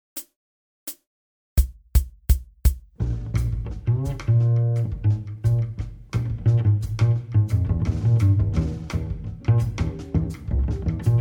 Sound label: bass blues samba; transcribing solos